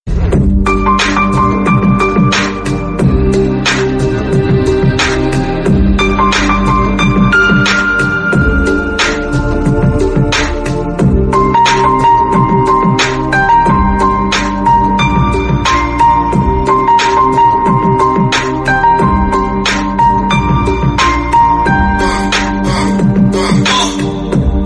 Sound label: Best Instrumental Love Ringtone